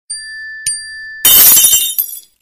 iphone glass break

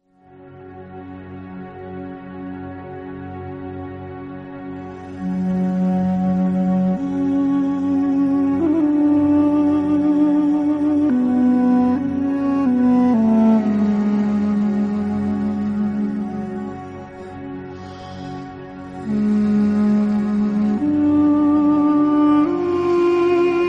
background music; bhoot fm

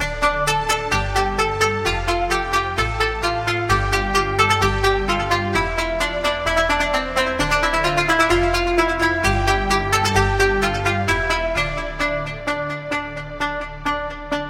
guitar ringtones

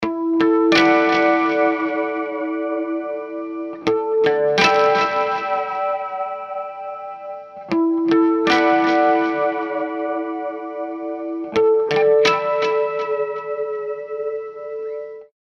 guitar pedal

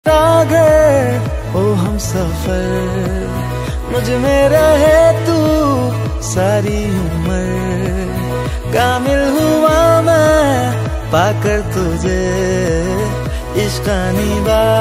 romantic song ringtone

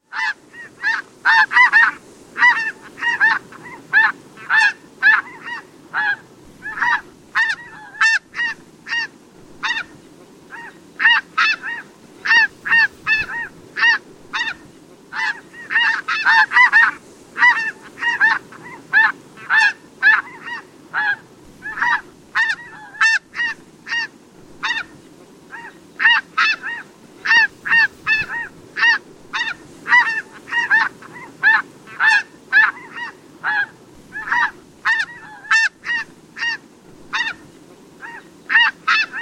snow goose

